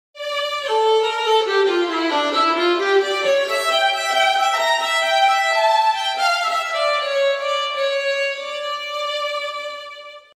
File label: Fiddle